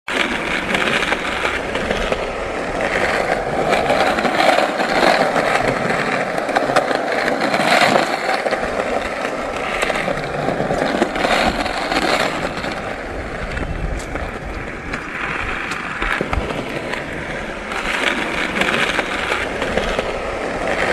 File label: skate